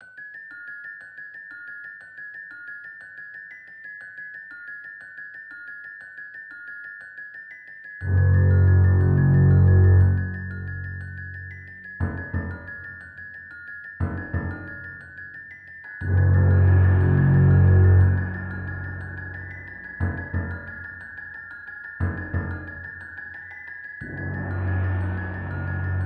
恐怖の夜の樹海, BGM

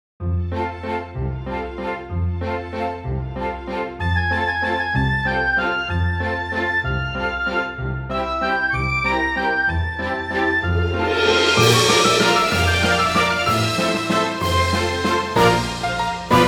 otyakai, bgm

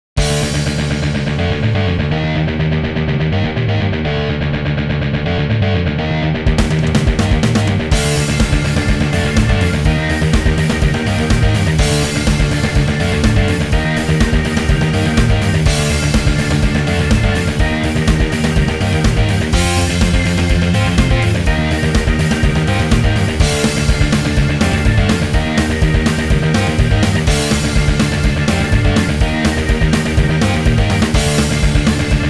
止まらない怒り, BGM

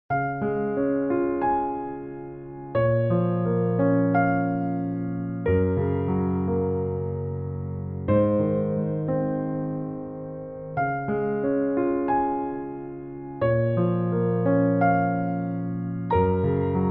natukasiki omoide, bgm

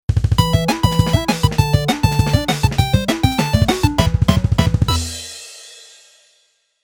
pikopiko

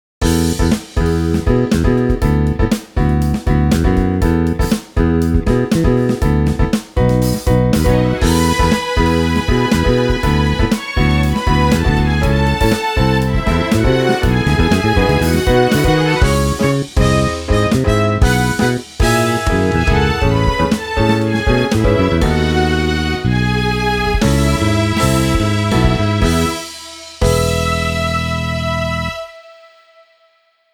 1nitiga hajimatta, bgm

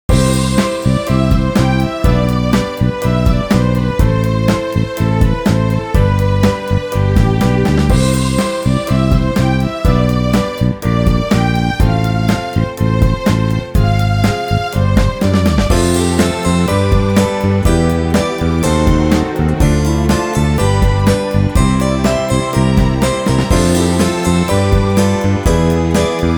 hajimarino tawn

